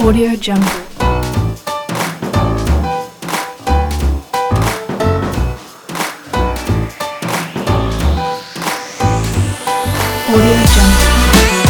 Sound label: jazzy hiphop